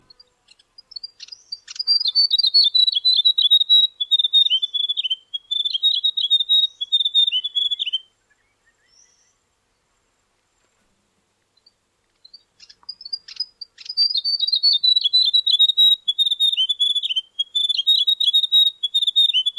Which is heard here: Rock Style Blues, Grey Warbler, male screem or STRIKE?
Grey Warbler